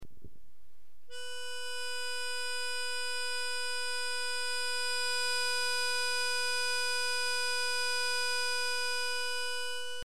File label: B Natural